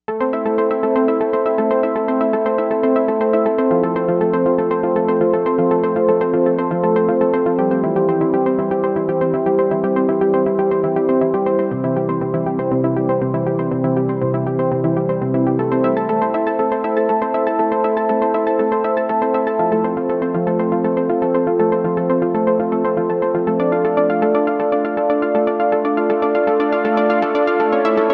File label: Synth Harp, Novation UltraNova; Factory Patches